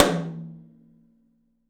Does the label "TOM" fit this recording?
Yes